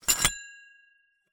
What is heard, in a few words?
Hole Saw Rattle, Hole Saw Bits